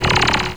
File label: laser